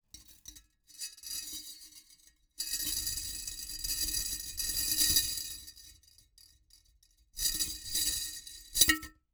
Spring Moves, Various, Glass, Rattle, Sharp, Jingle, Multi; Universal SFX